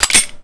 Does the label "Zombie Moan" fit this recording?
No